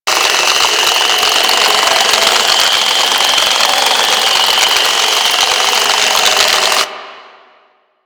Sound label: Infested Moa Nanite Loop; Warframe; Attacks